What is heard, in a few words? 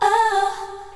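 voice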